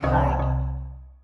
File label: ui toast level hard